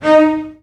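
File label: CELLOS F4, Strings; ILIO; Synclavier Strings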